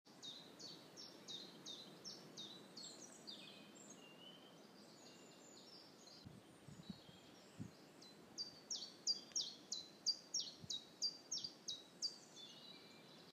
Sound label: Chiffchaff